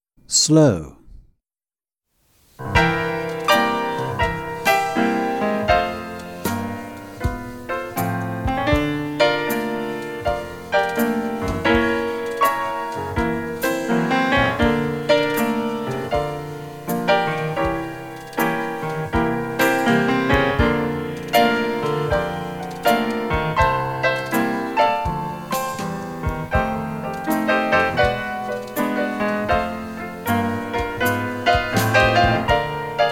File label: Slow 4 4